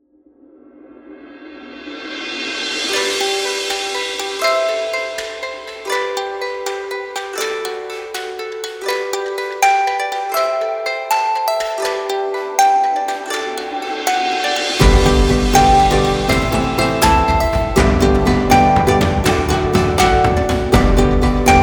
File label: E Ukulele Testfile